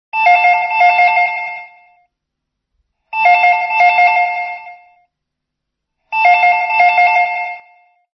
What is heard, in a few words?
iphone stock